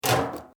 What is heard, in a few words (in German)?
Muelleimertonne schliessen